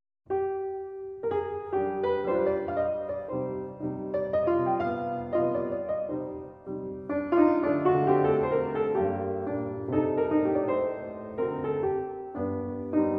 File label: Mazurka in C minor; Op 30 No 1